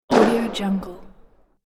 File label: fireworks one bang